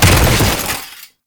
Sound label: Body Fall Default, Foley; Enemies; Warframe; Tau Dax